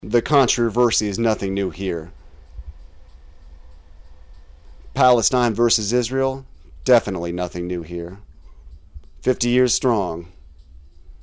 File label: 96 BPM